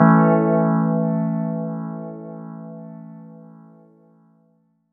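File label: A Maj chord; Flowerz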